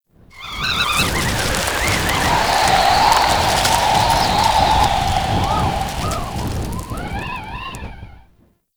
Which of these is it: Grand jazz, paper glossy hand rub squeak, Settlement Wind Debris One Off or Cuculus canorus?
Settlement Wind Debris One Off